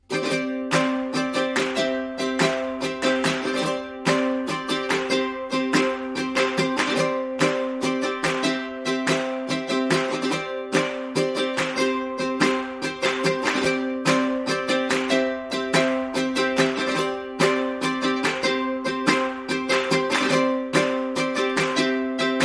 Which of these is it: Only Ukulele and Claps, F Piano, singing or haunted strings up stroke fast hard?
Only Ukulele and Claps